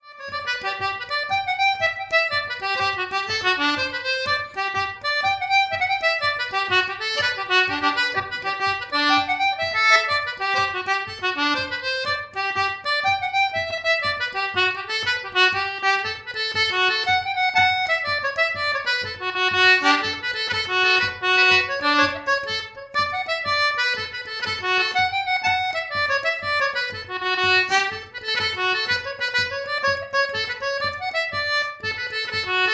Jig playing on concertina Wood End Floral Fretwork Standard Reed Cut; 7Mount concertina